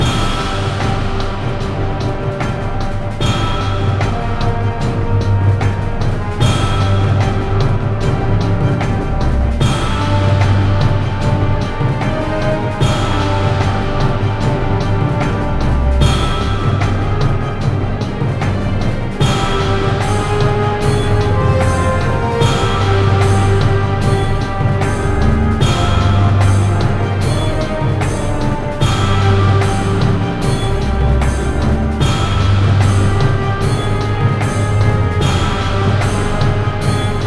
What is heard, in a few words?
Final Boss; Ruins